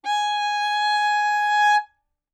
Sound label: Violin arco ff sul A Ab5; MIS Pitches - 2014; Strings